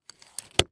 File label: Household Handle Luggage Handle Slide Up